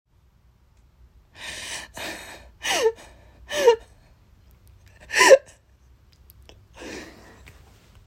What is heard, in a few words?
Woman Crying